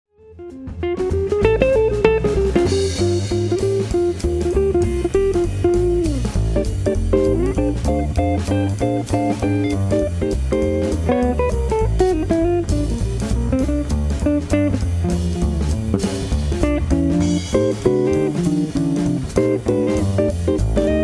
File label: Jazz Trio